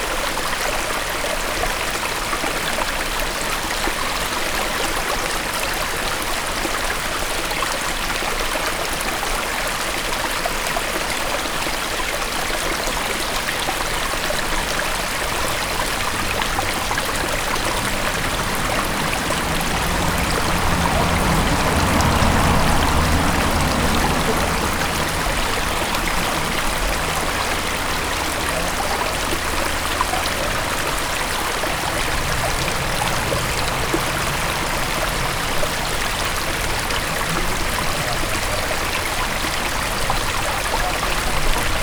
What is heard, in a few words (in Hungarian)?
csacsogo balra egy auto, patak; visegrad